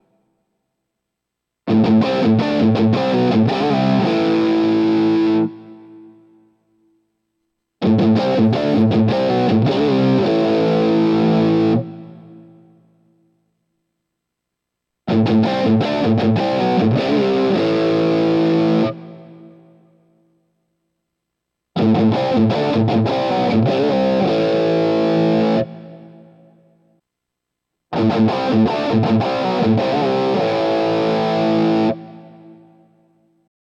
pickup mini humbucker crunch split; mh1 2 mini humbucker dual rail; panico pickup

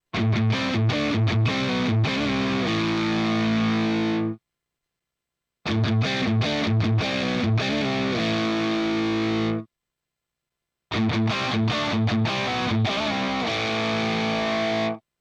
P90 Crunch; panico pickup p90hp1

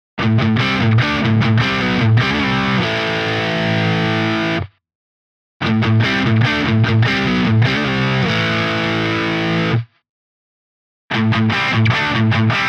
crunch split coil Humbucker; panico pickup hb3 humbucker

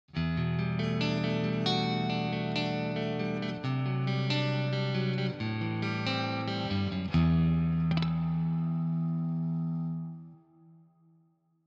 Clean split coil Humbucker; panico pickup hb3 humbucker

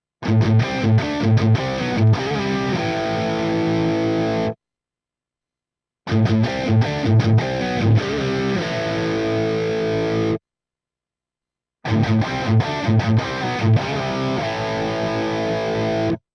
Crunch HB2CR Humbucker Cromo, pickup; panico pickup hb2 custom humbucker

